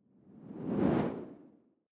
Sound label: whoosh simple flutter